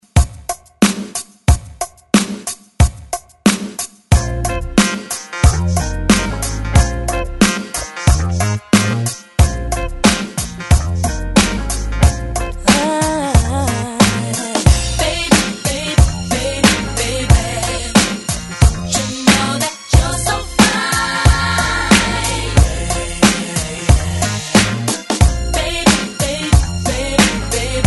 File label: 90s ReDrum 91 Bpm; DJDOLLS